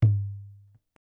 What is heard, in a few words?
tang; Gongkang